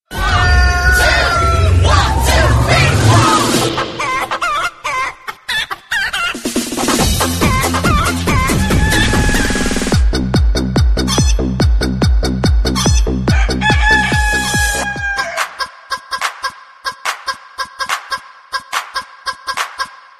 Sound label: hiphop; att samsung rugby ii